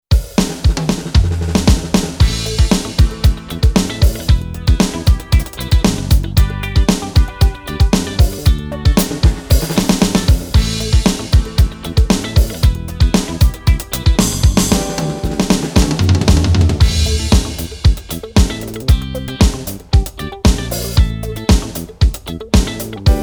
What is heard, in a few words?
funk 1 yamaha